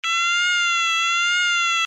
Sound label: alarm tone, sounder alarm tone; sounder beacon